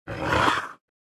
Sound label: Monster vocalization